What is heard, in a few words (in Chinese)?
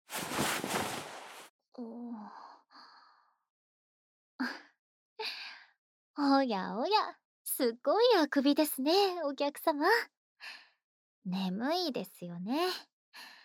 安眠魔术・陪睡＆心音（安眠的拍背、心音）, ASMR